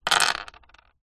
leisure dice x4 down on wood

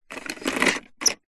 leisure toy plastic cogs turn single

